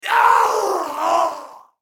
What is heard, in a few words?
k o scream; mk9 scorpion